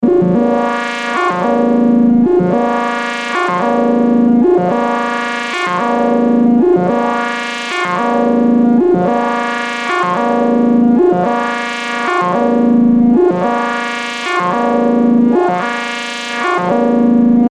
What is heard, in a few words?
loop 110